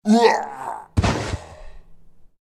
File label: snow man death